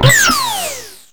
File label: teleport in; mm7sfx